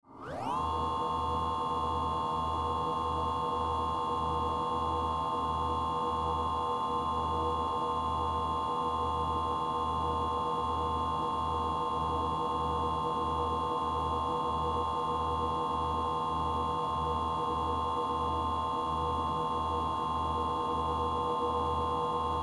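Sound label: quadcopter drone sounds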